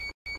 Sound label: Reminder, police; SSP3000